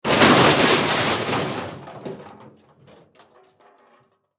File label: Impact Crash Metal; Materials